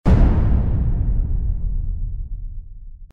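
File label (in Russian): удар по металлу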